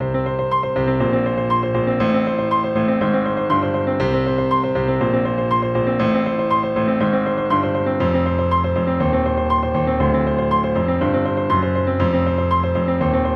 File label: Exercise in Repeated Notes over 2 Octaves